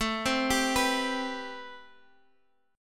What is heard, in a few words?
A-Minor 9th A Guitar Half Step Down